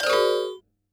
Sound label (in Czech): Klavir; After Dark Flying Toaster HD